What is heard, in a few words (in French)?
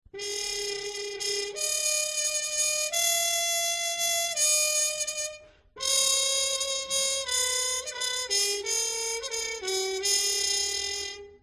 Sourdine metal Trompette